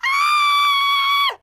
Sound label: female scream